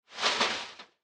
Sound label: recycler trash; Factorio